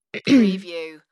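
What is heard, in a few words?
Woman Clear Throat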